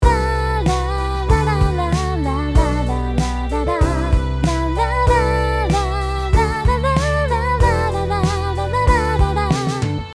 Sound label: Counter Interval